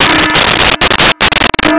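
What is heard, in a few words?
ambience signal horn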